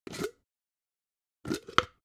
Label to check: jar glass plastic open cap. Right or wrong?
Right